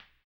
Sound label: HAMBONE, Vocal Blues Soul; Spectrasonics; Planet